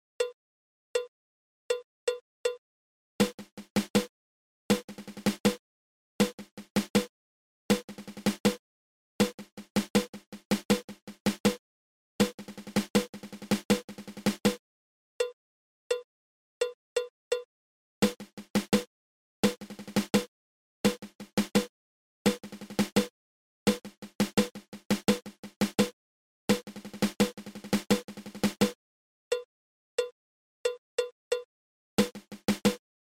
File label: Six Stroke Roll